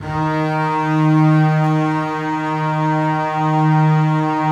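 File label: basses sustain d#1; Sonatina Symphonic Orchestra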